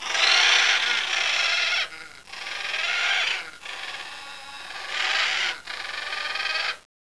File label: Macaw; copan